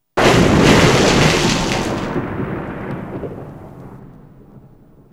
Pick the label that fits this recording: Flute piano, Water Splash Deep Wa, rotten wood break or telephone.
Water Splash Deep Wa